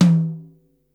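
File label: tom mid, Yamaha Motif ES-Series; 300 Drum Machines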